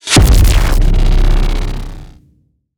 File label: Impact